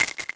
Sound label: Shell, Machine Gun